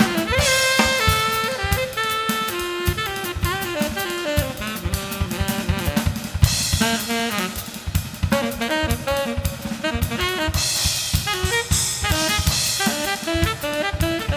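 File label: battle sax drum; stranglehold; mus mega battle2 sax drm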